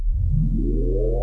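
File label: laser on; quake2; railz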